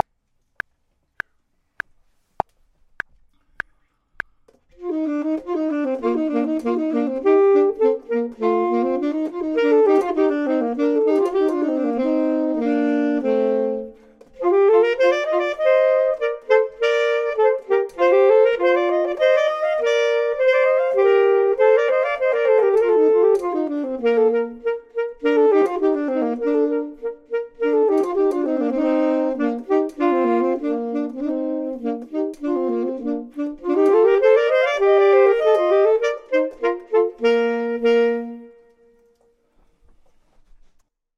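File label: both, Saxophone and Clarinet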